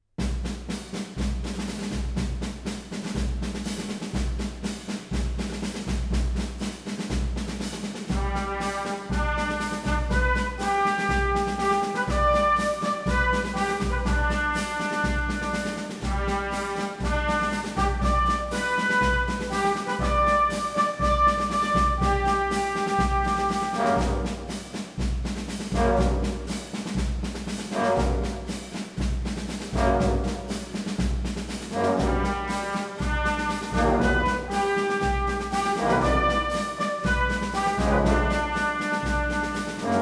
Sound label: the swing march; film music arrangements